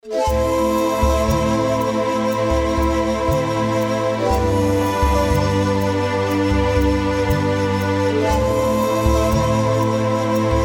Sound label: Shakuhachi